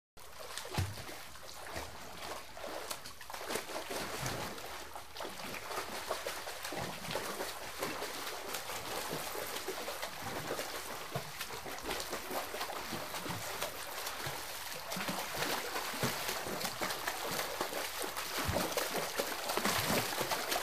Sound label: Swimming Lap Swimmi, swimming